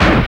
SNR, Snares; Sn Modules 5; Roland L-CDX-01

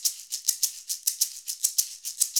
shakers

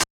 Yamaha DJX 2B, SD Elect.

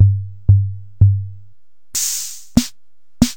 Panasonic RD-9844

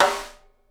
SNARE, Live Snares

